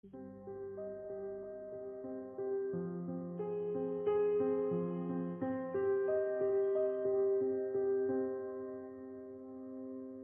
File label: The Bells